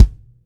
BD, Bassdrums, Roland TD-20

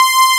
DANCE SYNTH; E-MU Formula 4000 Series Vol. 5; Protozoa; Orbit Presets

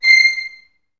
C6, Advanced Orchestra; Best Service

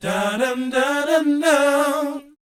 BOYZ, Vocal Planet, R&B & Dance; ILIO

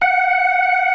CLASSIC C3, Synth and Organ

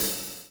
PULL, Backbeat; Spectrasonics - Backbeat

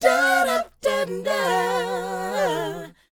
DOWOP C#, Vocal R&B DOO WOP; ILIO; Vocal Planet

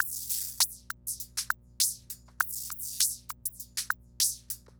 Remixed Drums 100BPM; The Roots Drumloops

